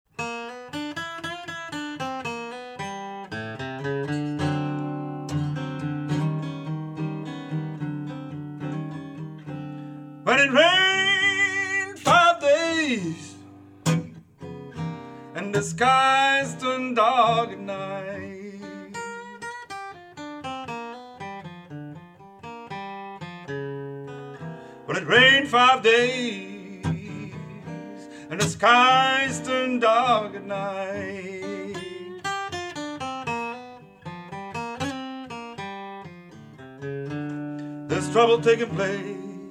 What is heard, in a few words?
blues